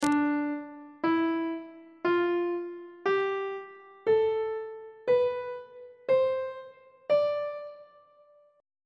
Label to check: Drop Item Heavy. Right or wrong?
Wrong